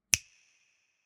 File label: finger snap with reverb